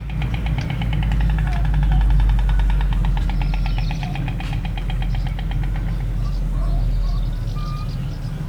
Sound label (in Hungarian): balról és messziről kelepel, fehér gólya; Tiszavalk